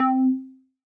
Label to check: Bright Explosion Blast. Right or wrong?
Wrong